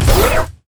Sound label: crit attack; rumble skin03